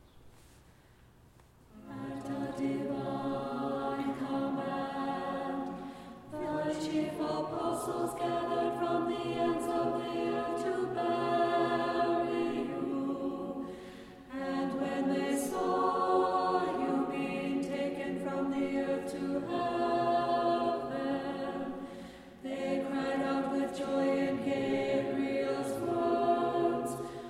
Tone4 Kievan Chant Sticheron Example Alto

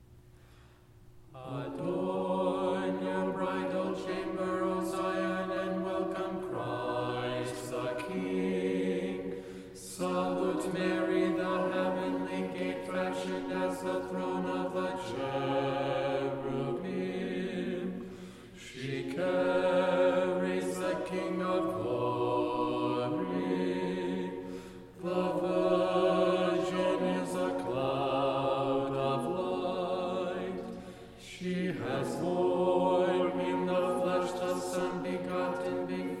Tone7 Kievan Chant Bass; chanting tutorial

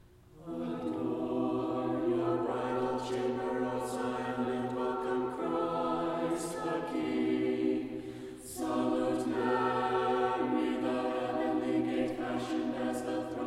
Tone7 Common Chant SATB; chanting tutorial

